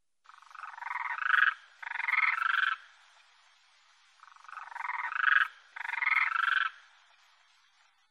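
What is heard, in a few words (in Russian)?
krasavka golos, demoiselle crane voice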